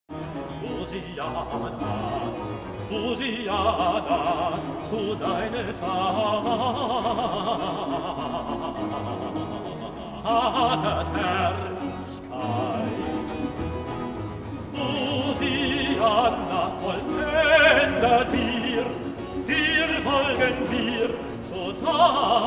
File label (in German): Choral Wachet Auf, Ruft Uns Die Stimme